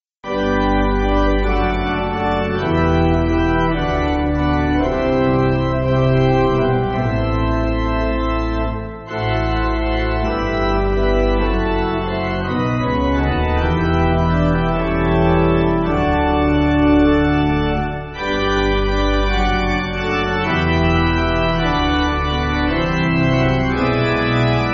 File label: Pipe